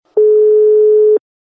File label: call dial